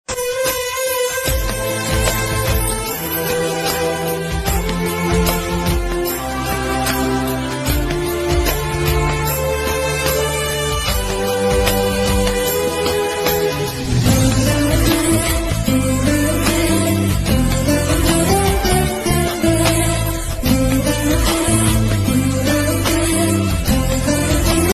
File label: Tamil love bgm